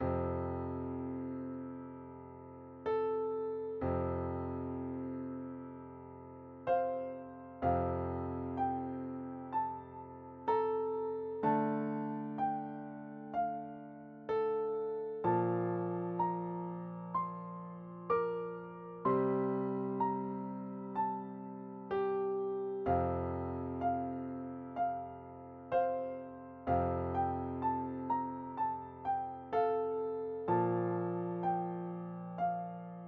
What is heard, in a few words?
frog song healing